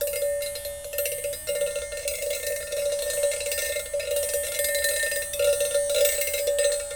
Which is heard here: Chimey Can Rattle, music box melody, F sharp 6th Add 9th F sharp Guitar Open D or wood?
Chimey Can Rattle